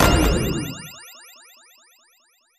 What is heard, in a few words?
mega reel stop; Hot Spin Deluxe